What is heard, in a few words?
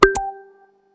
Click